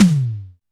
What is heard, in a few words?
Tom Lo